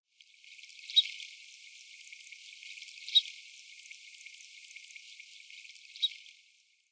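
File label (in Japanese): hajirokai, red-necked grebe; ハジロカイツブリ; 兵庫県たつの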